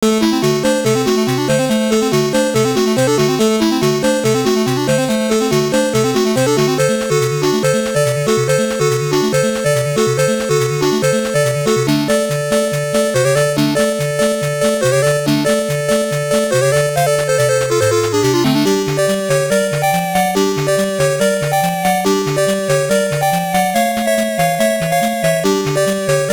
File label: BGM; Tetsujin 28gou; WonderSwan